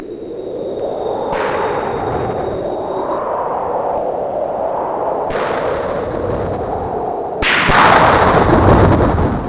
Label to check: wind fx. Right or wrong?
Right